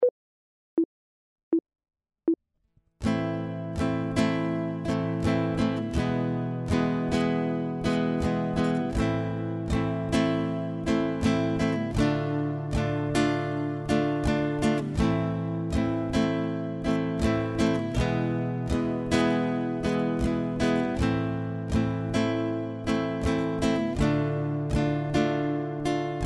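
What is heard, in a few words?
chord, guitar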